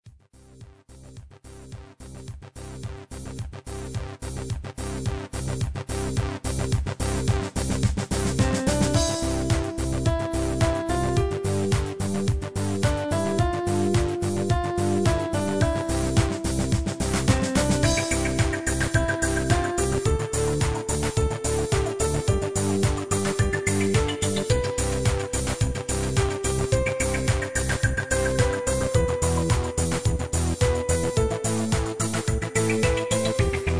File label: house